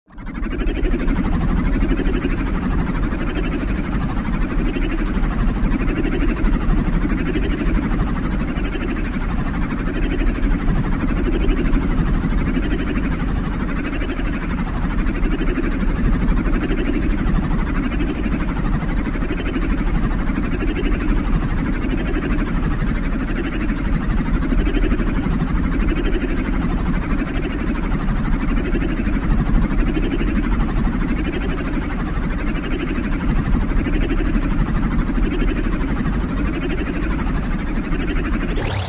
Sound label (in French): Alarme